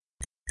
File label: back tick